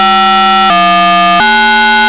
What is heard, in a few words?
tone code